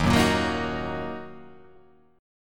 D sharp Minor, chord

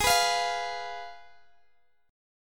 Ab Minor 6th Ab, Guitar Open G; down

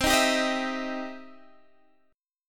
E Minor Major 7th Sharp 5th C

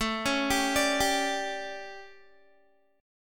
A 11th, Guitar, chord; 17,16,17,19,19,x; 4E,4A,5D,5G,5C,6F; arpeggiated

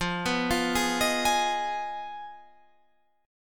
F Minor 7th Flat 5th F down; Fm7b5; arpeggiated

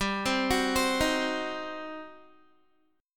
G Major 7th Suspended 4th Sharp 5th, Guitar; GM7sus4#5; arpeggiated

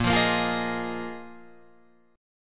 Bb 7th Suspended 4th Bb Guitar Open F; strummed

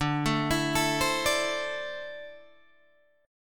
D 7th Suspended 2nd down Guitar DADGAD; D7sus2; arpeggiated

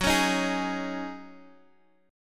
Eb Minor 11th Gb

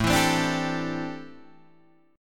A Minor 11th A Guitar Open G

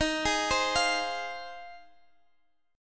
F Minor 7th Eb

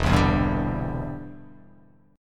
E Minor 7th Sharp 5th E; strummed

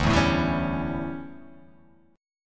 D Major 7th Suspended 2nd Suspended 4th D

